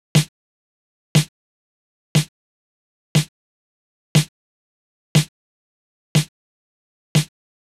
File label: Snare, 120bpm; Construction Kits; Zero-G; Nu Disco; Nicengroovey C